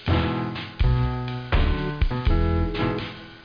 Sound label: short up; ClickArt 300,000